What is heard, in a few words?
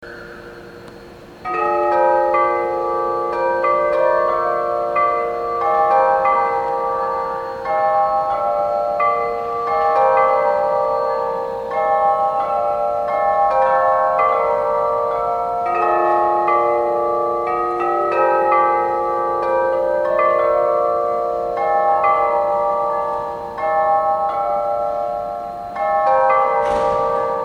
crystal melody